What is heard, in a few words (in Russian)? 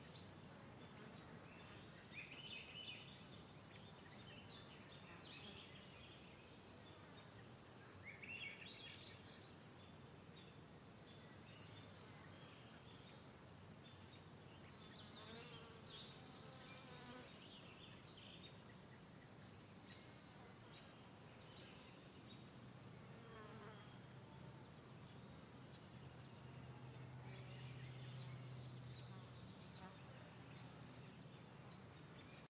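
успокаивающее летнее приятное звучание птиц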